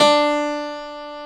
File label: PF, GRAND PIANO; AKAI S6000 CD-ROM - Volume 1